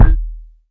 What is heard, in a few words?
puffy kick single shot d sharp key, kick sound tuned to the D# note; kick drum